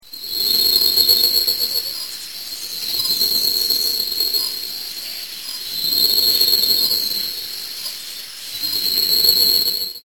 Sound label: frog